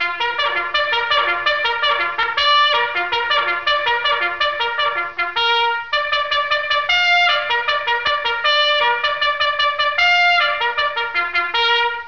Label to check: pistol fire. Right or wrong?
Wrong